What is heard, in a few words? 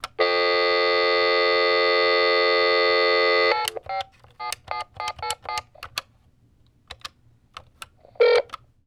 Telephone Speakerphone Dial; 2496SoundEffects; Tech Toys Electronics Pack 1